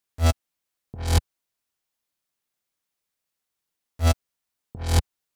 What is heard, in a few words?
126BPM Cutted; Vengeance Samples; Tech Freak Kit